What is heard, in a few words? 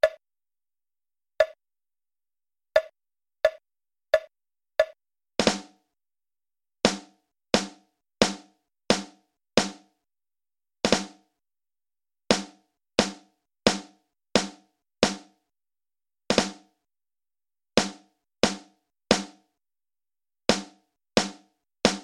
Drum Recordings; drums